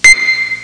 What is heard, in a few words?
HIGH PIA, STRINGS